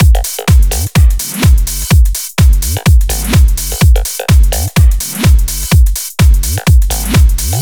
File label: 126BPM Pong Kit